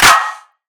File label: Clap, Dubstep